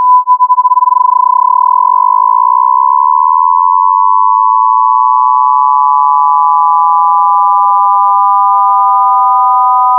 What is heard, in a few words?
modulated tone varying freq